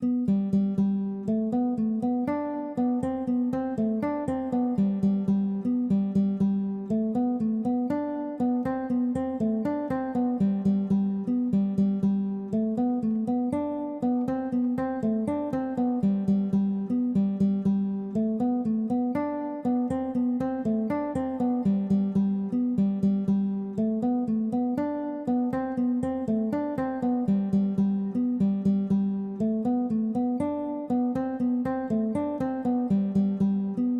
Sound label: The Cats Jig Background, dadgad